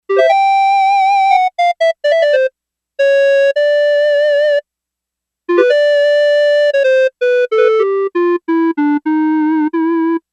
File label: Clarinet, Yamaha DX11; Factory Preset Voices